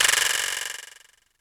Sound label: Drums C#4; Roland E10